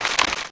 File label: Crab Walk; Finding Nemo; XBOX